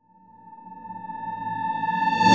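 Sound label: Reverse Core String, Cinematic Metal Impacts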